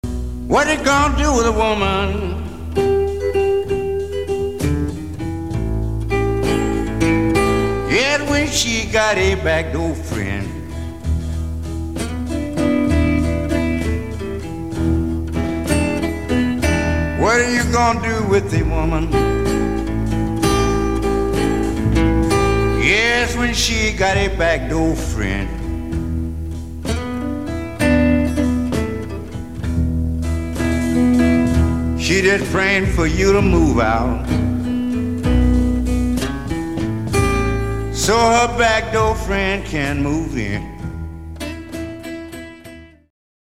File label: Blues